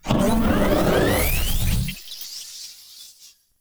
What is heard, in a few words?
prepare, cylarkan, creatures; combat; CosmicRageSounds